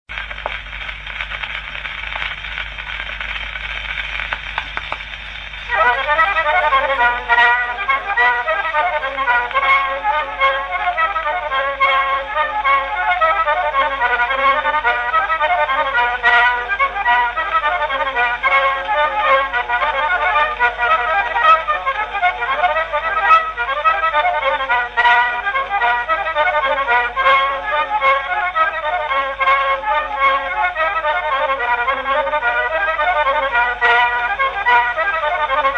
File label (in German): Akkordeon Medley, Phonograph